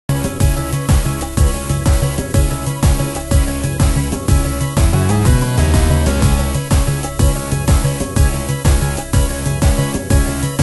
drum

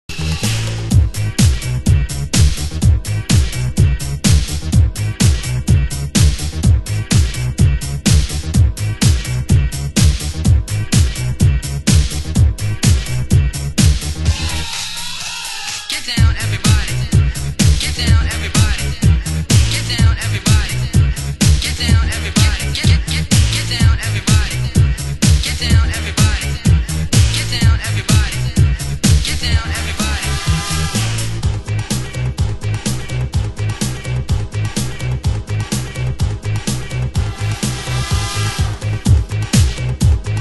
funk

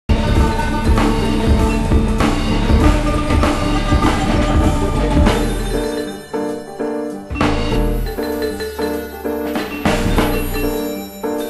sax

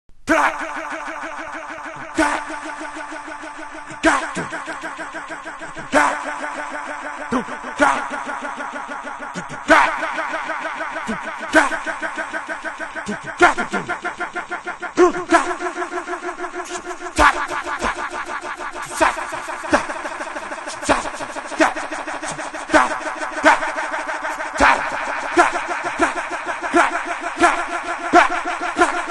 drum

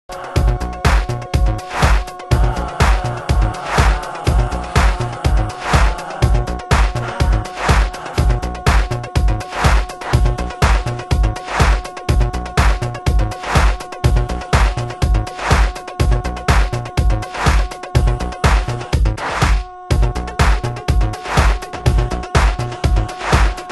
disco

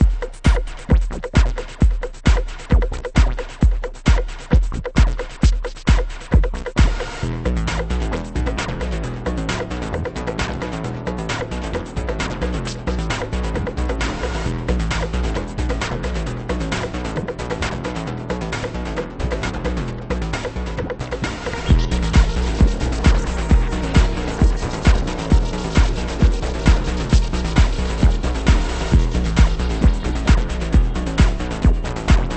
moog

